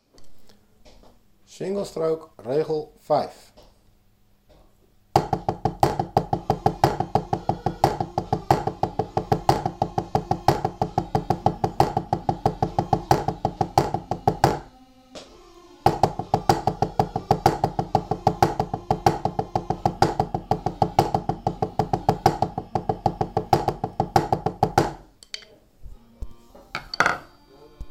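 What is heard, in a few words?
Single stroke roll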